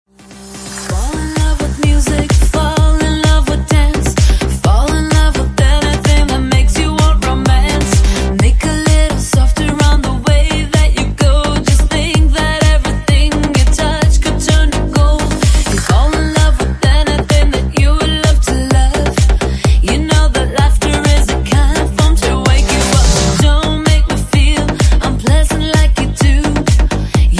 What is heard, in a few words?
electro mix ringtone